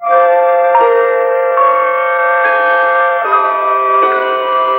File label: Ancient Music FX, Industrial